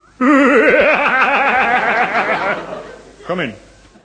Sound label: laugh